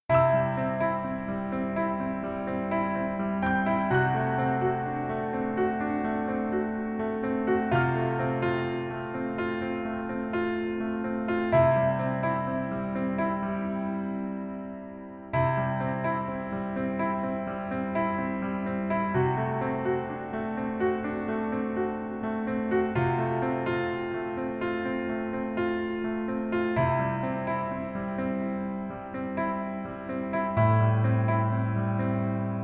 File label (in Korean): Piano ver -1키